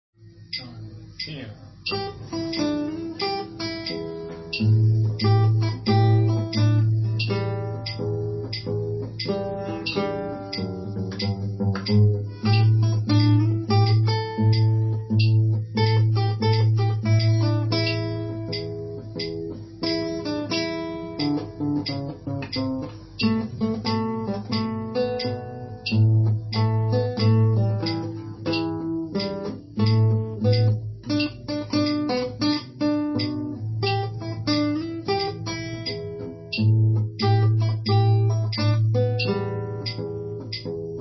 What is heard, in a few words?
slightly tougher blues